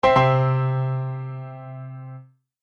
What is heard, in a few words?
Ta-Da Piano; Musical Sound Effects